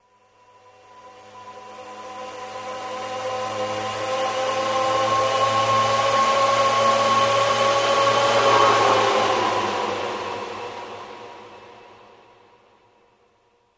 Torpedo Jet By, torpedos

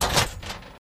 Cabinet Metal Ems Ga

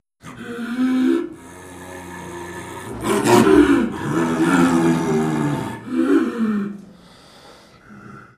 Leopard Snow Growls; big cats

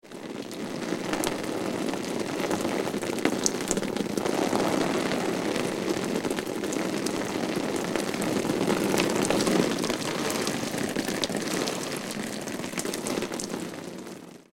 Rain On Helmet; raindrops, splatty

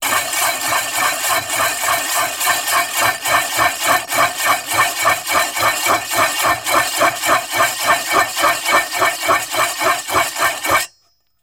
Car Tire Spin Freely

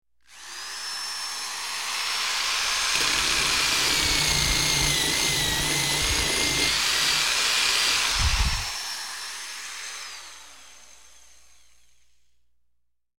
Metal Drill In Out, air tool

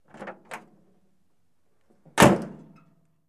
1947 Dodge Door; dodge pick up 1947

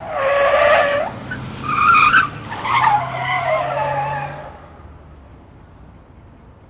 Car Chase Swerve Sque, skids autos

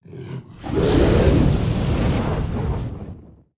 Dragon Roars Breaths

